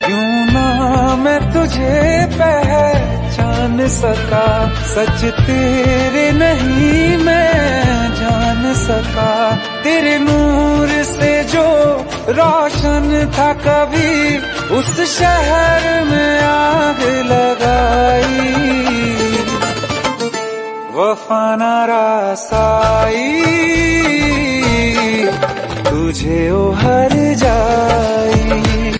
Sad Ringtone

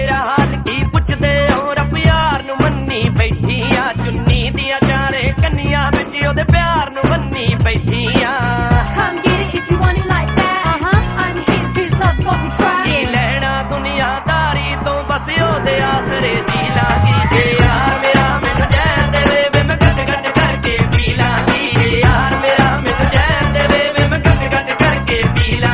Love Cut Songs

